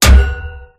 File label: metal bullet hit